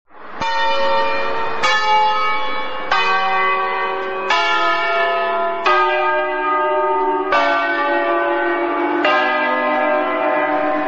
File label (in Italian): Scala, campanari